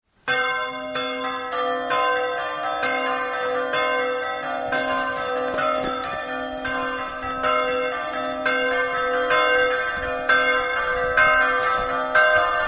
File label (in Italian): campane; porto